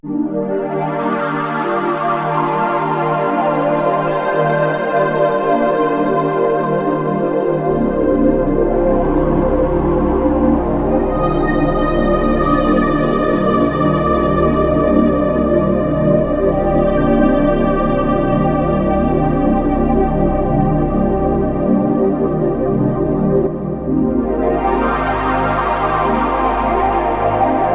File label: voice pad; diva